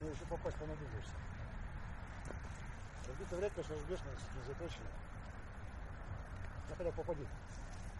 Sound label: a train